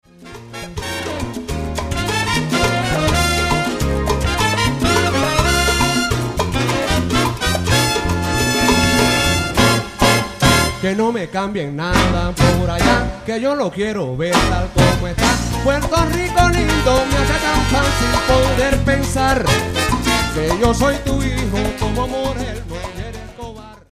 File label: Mambo